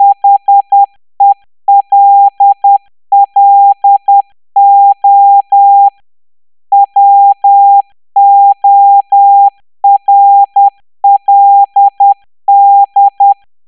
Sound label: Hello World, morse